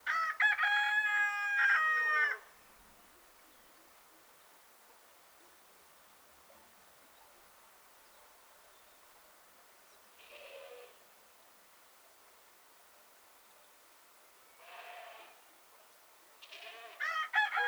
Rooster singing soft breeze cows dogs; Dizzy Duck; Sounds From Nature

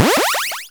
upgrade; Kenney's Sound Pack; Retro Sounds 2

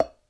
mug pop; Sound Design Tools; Raw Foley

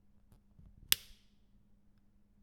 Rear shifter click, Bicycle; Submerged Tapes; Bowed Bicycle

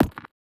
Percussive Elements

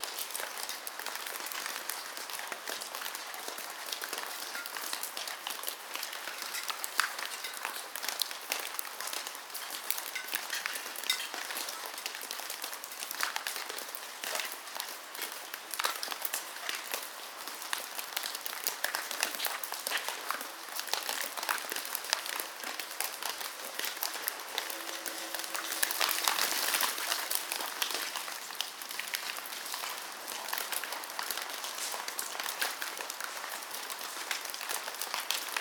Ambience Rain Pouring Long; Blond Panda; Decaying Factory

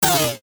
Futuristic Gun Sound; FXHome; Bullet and Gun

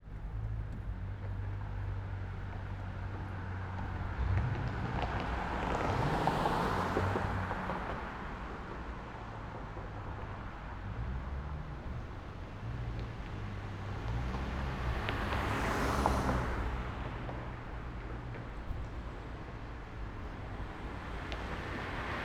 City Cars By Close Pedestrians; Cities; 2496sfx

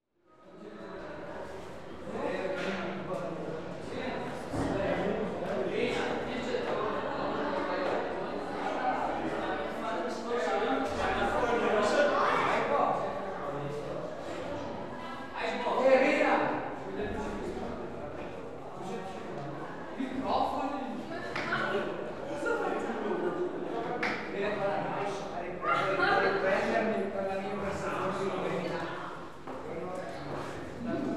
Italy, Mixed Teenage Chatter; European Soundscapes; BBC Sound Effects Library

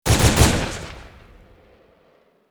Bullet Time; FXHome; Bullet and Gun

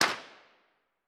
Wooden Plank Snap; 99Sounds; The Warehouse

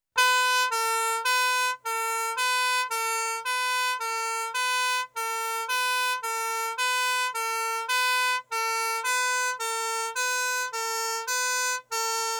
Two-Tone Horn Siren (Exterior Aco; Emergency; BBC Sound Effects Library

